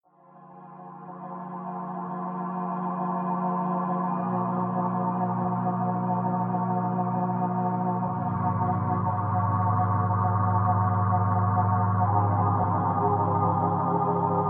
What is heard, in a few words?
432 hz guitar chill